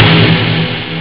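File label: funk snare drum; jazz jackrabbit 1; M8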